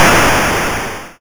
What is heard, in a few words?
YM Noise; NOISES; ChipShop Deluxe; ChipShop Hits